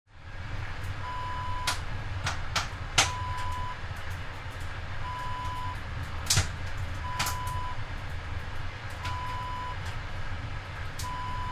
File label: sauna wood burning ambience